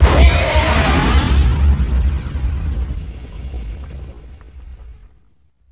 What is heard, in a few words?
explode tie sentinal